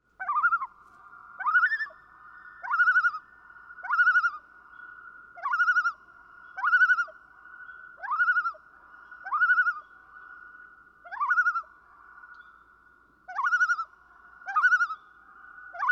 Common Loon

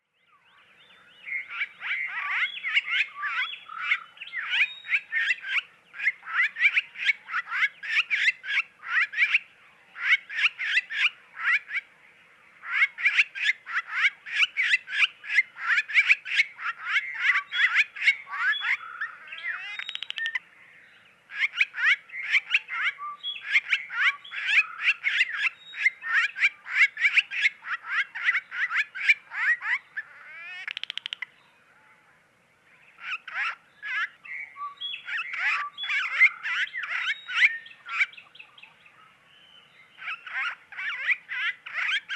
Barn Swallow

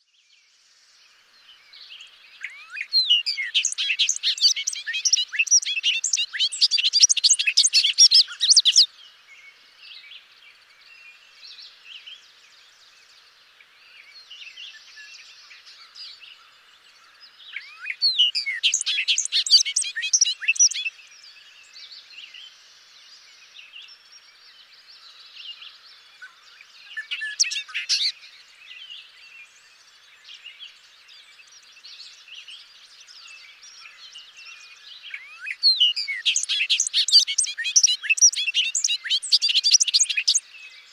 Bobolink

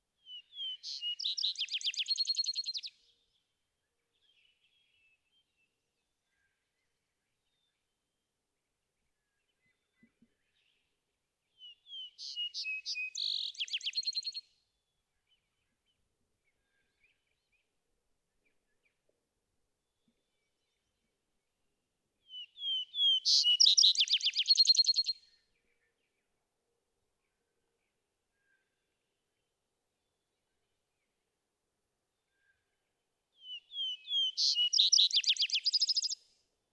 Vesper Sparrow

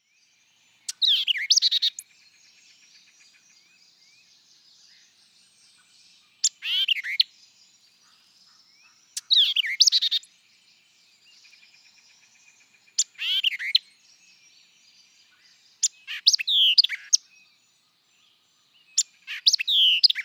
White-eyed Vireo

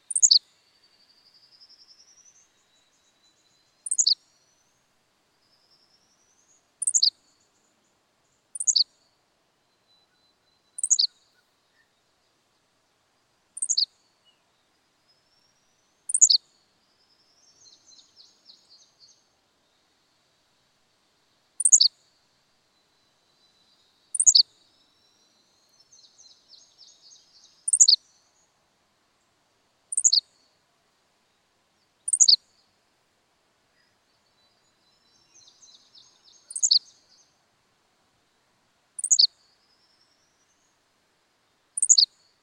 Henslow's Sparrow

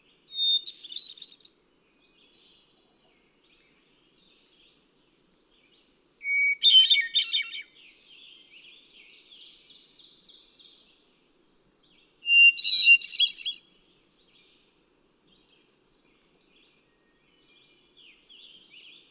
Hermit Thrush

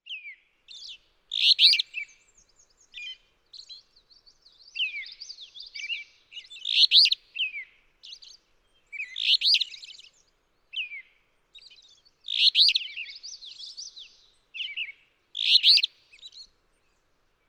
Alder Flycatcher

